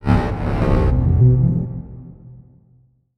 Title Transition Distortion; Adobe; Production Element

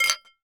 Impact Glass Clink Two Bottles

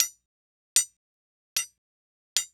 Industry Blacksmith Pound Metal

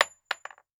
Weapon Bullet Shell 45 Dropping On Solid Wood; AdobeCC

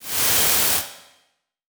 Transition Noise Static; Production Element

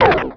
Digital; Adobe; Multimedia Internet CD-Rom Flash

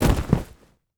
Horror Body Drop

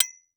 Impact Metal Object Solid Hit By Copper Pipe; Impacts

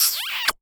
Cartoon Kiss Short Squeaky Cartoon Kiss; AdobeCC

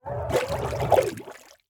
Liquid Water Water Scream Under Water Short

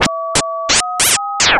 Science Fiction Sci-Fi Electronic Radio Static

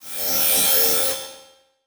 Transition Feedback Electronic; Production Element

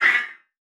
Multimedia Internet CD-Rom Flash Whoosh Short

